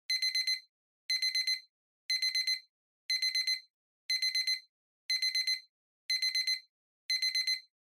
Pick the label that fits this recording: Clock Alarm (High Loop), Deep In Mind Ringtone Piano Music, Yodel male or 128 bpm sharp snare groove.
Clock Alarm (High Loop)